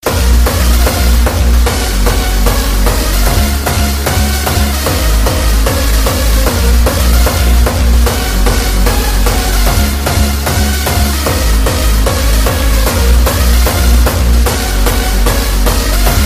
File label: Beat